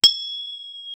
crafting alchemy stuff

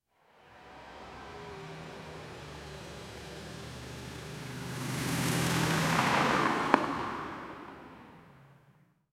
car crash

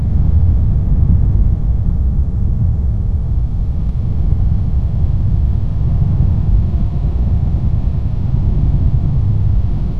loopable sound of a giant, disaster

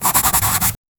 pencil one stroke linear; writing

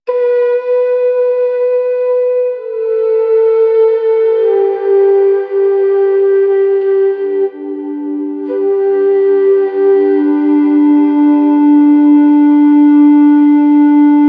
calm instrumental, meditative

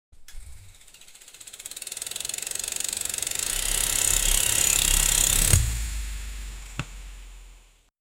activa 5g bike start, scooter

